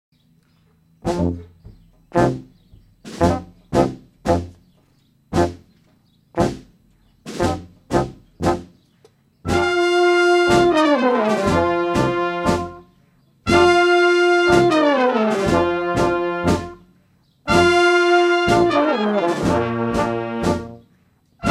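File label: Blues March, Brass Band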